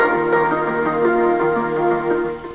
sunrise small no drums

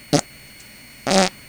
fart

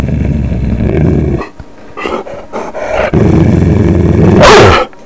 growl

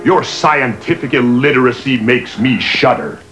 shudder

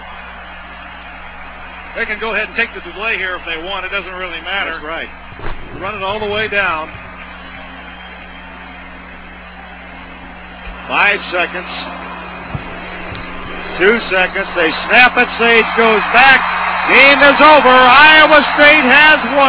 win

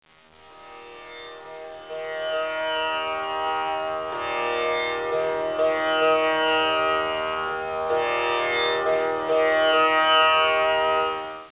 tambura

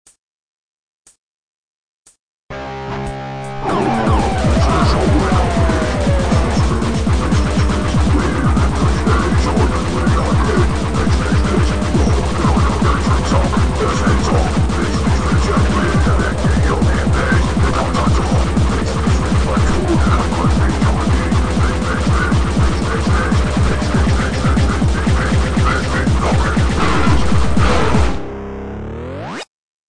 fish death

